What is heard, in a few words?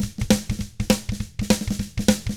shuffled drums; ILIO; Double Platinum Drums 1